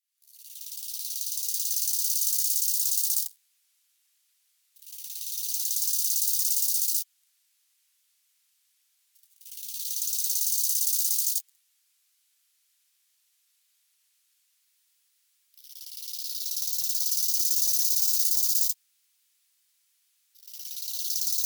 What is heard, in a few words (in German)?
nachtigall grashüpfer, heuschrecken